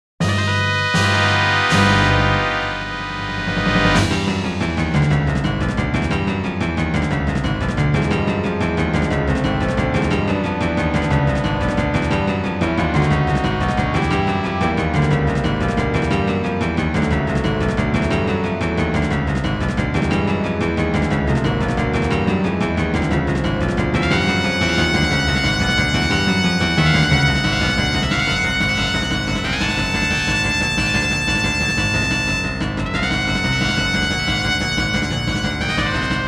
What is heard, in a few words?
Boss Battle; legend of zelda; Ocarina of Time